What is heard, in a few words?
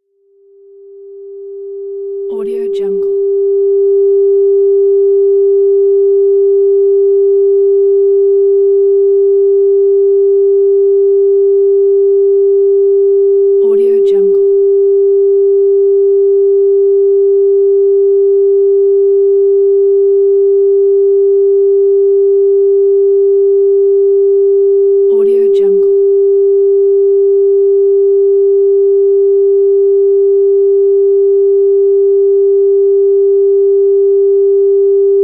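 solfeggio frequency tone 396Hz; electronplanet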